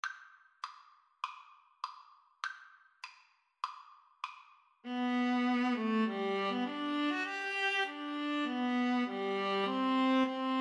viola, Viola Duet

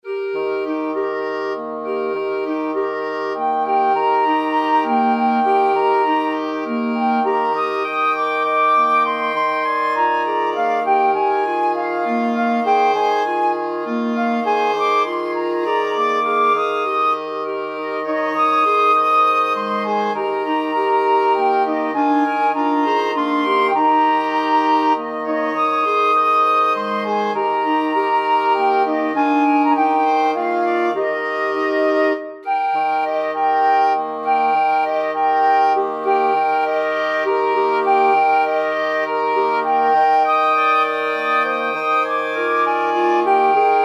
lullaby, wind quintet; brahms

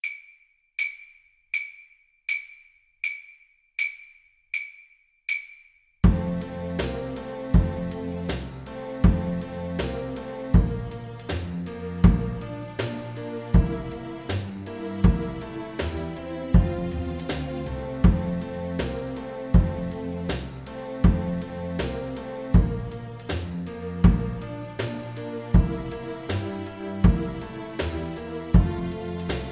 jingle bells, Recorder

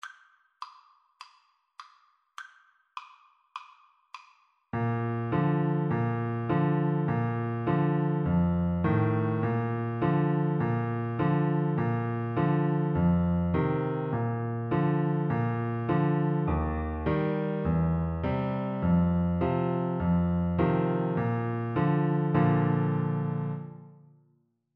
yankeedoodle BN, Bassoon

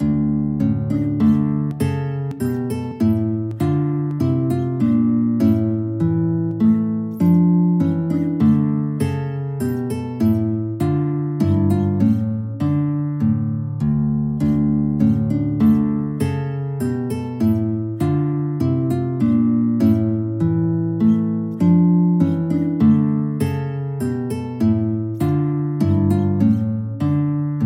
Air guitar

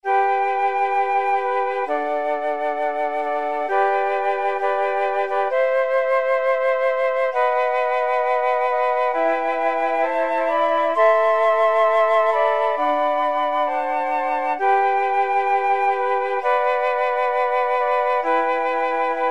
flute quartet

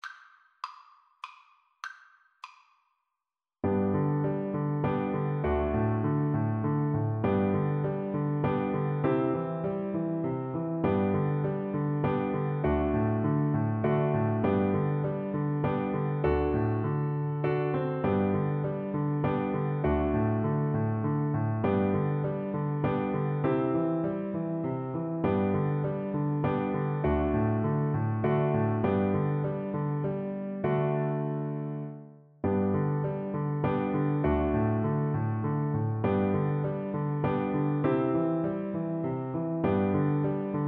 man you dont meet, Viola

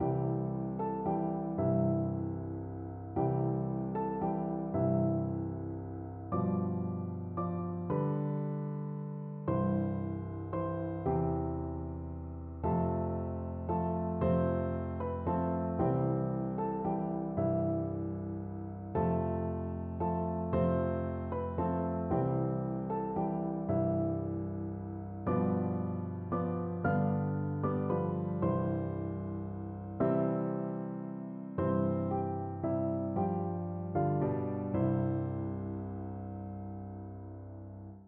silent night violin PNO duet

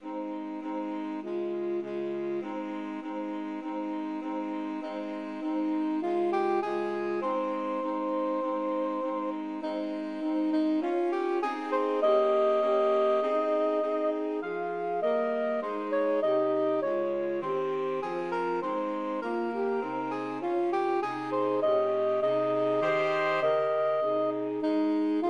SAX quartet; pomp 4

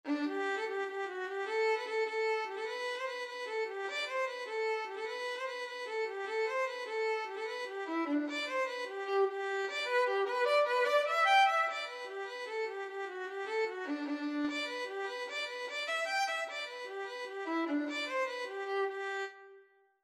violin

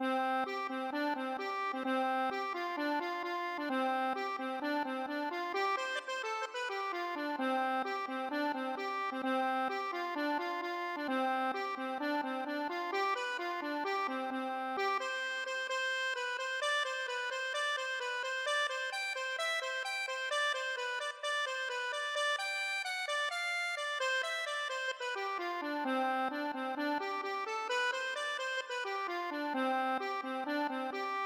accordion

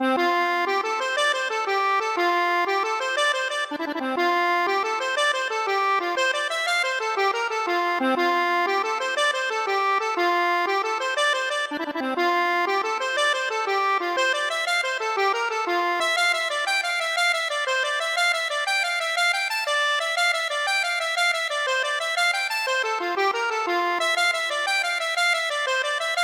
accordion

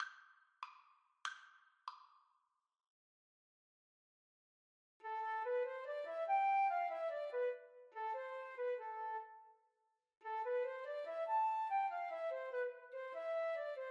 Flute duet

